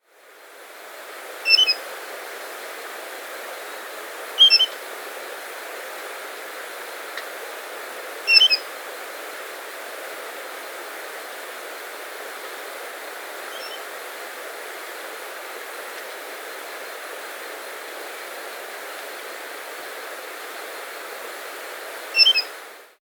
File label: Streak-Necked Flycatcher (Mionectes Straticollis), Andean Birds; S. America